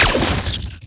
orb bolt

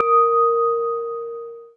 windchime; ambient; Counter Strike Model Pack; CS:GO

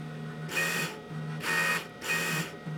tech oneshot, office; Counter Strike Model Pack; CS:GO

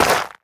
scuff, footsteps, player, dirt

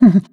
breath short, zombie

